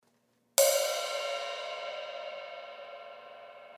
Kerope, cymbal sound

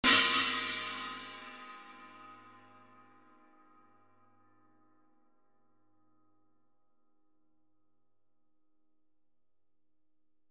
14in Mini China, cymbal sound

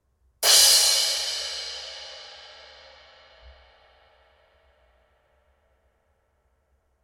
16in EFX, cymbal

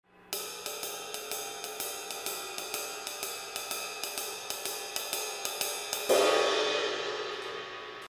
Uptown Ride Pattern

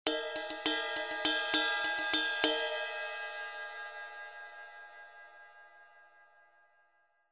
20in Custom Medium Ride, cymbal sound

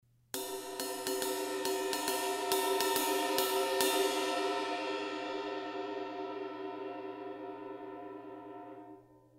A Avedis Ride Pattern, cymbal sound

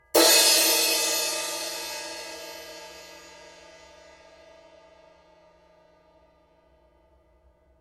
17in Crash; ZBT; cymbal

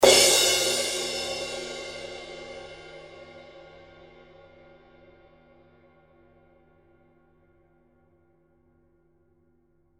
20in Custom Crash, cymbal sound

